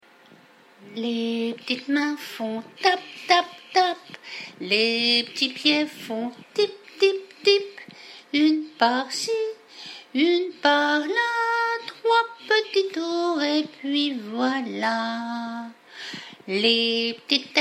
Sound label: tap tap tap